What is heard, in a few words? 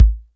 fat kick; Greytsounds